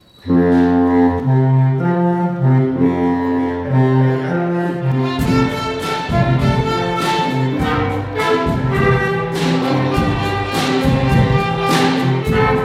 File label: orchestra; navidad